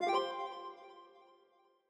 Background; Longhorn Ten Beta Sound Scheme by David Mounder

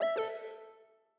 Hardware Remove, sound scheme; Longhorn 7ista Sound Scheme by David Mounder

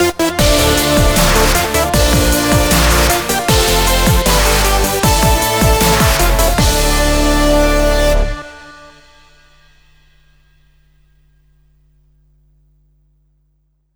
Game Clear; Sonic & All-Stars Racing Transformed - Original Sound Version

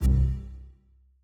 Battery Low; Longhorn XP Sound Scheme by David Mounder; Reincarnation of Windows XP Sounds in fake Longhorn (Samsung Theme for WinXP) style by David Mounder

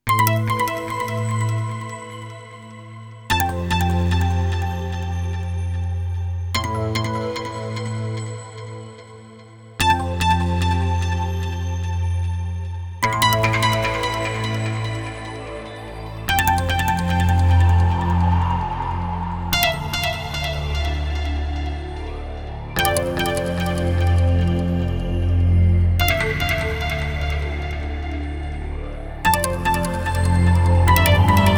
Synth Melody; Vaporwave

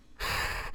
Breath; Princess & Conquest v0.20.06

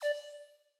Speech Disambiguration, sound scheme; Longhorn 7ista Sound Scheme by David Mounder

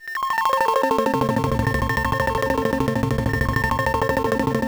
cool mystery less; Junkbot

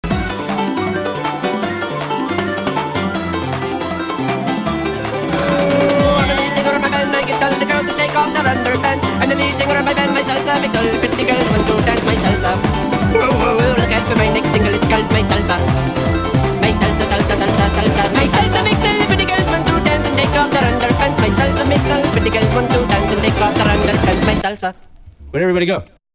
Salsa Section; Sonic R

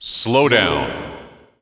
appear slow; Drunken Robot Pornography; Inago Rage

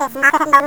Voice Hum; Princess & Conquest v0.20.06

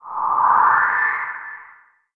match medium; Audiosurf; cyclone

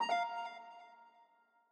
Speech Sleep, sound scheme; Longhorn 7ista Sound Scheme by David Mounder

